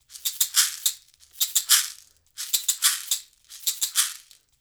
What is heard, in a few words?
LOOP, SHAKERS, Percussion